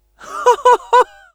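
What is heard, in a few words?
laugh happy, French; Scout; [Rire Joyeux 3]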